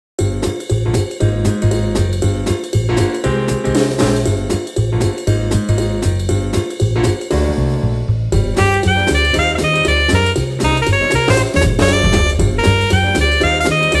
Night detective, bgm

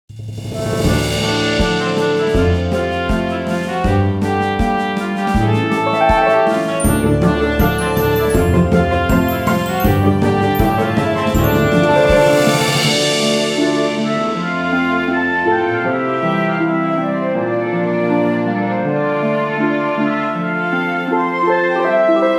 mysterious island

